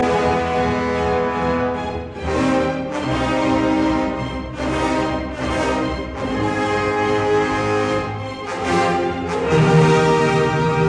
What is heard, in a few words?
Symphony in D minor Scherzo; Bruckner; WAB 109; original 1894 version ed A Orel